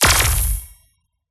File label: Weapons; PMSFX